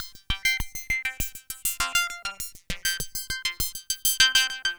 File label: synth 100 bparps C F G; Transmission-X